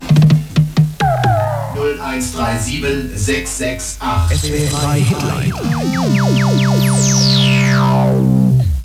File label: jingle; swf3 hitline